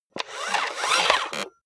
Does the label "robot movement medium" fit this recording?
Yes